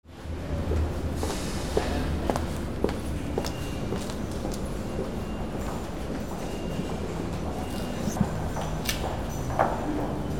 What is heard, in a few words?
AMBIENCE